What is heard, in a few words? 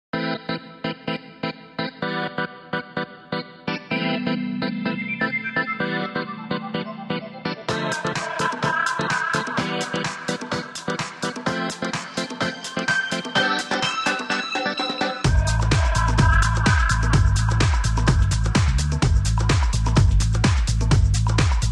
contemporary urban pop miami beats